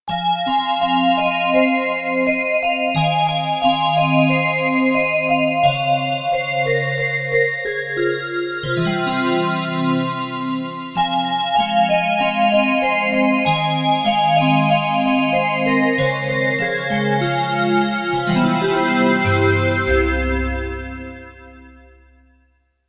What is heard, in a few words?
Wave Bells, Roland JV-880; Factory Patches